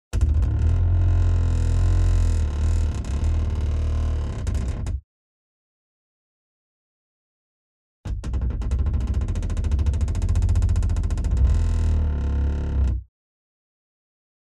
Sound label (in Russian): Kren sudna